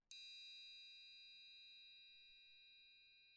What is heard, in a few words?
Triangle Ring Soft